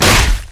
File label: basic attacks; LoL; vi